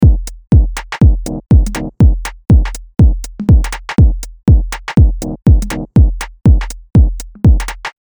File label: Drums